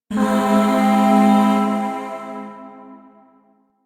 Shawzin Phrygian Chord I; Music; Chords; Warframe; Zariman Void Shawzin; DE

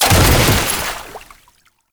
Body Fall Water; Enemies; Warframe; Tau Dax

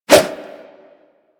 Nunchaku Whoosh; Warframe; Tenno

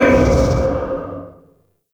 Survival Spirit Impact; Warframe; Zariman

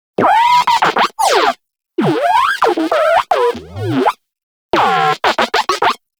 Moa Compassionate Vocal Interact Sit Side; Warframe; Pets

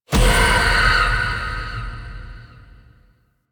Evolving Weapons Upgrade Success; Zariman

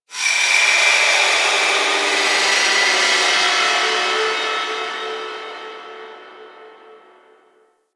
Moon Texture Medium, Ambience; Warframe; Orokin

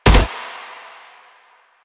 Mech; Duviri; Incarnon Adapters; Incarnon Sicarus Prime; Weapons

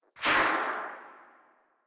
Vomit Gun Tail, Weapons, Infested; Warframe

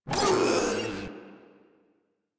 Proto Infested pain; Warframe; InfestedNinetyNine

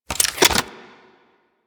Briefcase Akimbo Pistol Unequip; Warframe; Weapons; Corpus

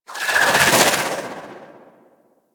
Spear Projectile, Weapons; Warframe; Tenno; Priest Spear

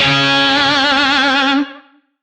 Shawzin C Sharp; Oct Two; Music; DE; Warframe; Lizzie Shawzin

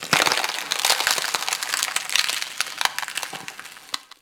Landscape Ice Crater Creak; One Offs; Warframe; Venus Open World

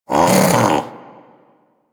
Armadillo Vocal Alerted; Characters; Warframe; Hunting Critters

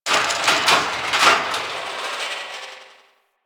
Metal Scrapes, Ambience; Warframe; Corpus Railjack

